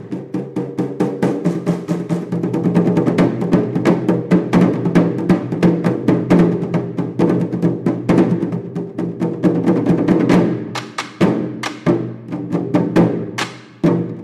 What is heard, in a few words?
japanese traditional